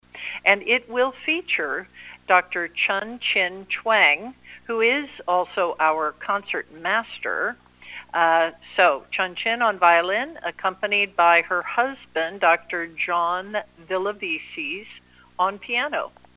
piano and violin and the sweet sweet sounds of music ecstacy